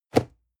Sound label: cardboard box medium place soft; objekte